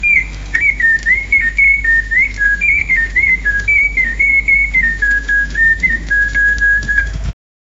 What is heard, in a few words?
the happy birthday song, car honking